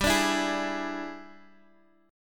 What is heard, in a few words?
E 7th G, guitar; E7/G strummed; JGuitar